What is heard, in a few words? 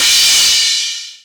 Crash, Hip-Hop